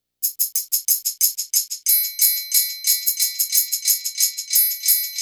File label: Natural Tamb, Percussion; Beat Components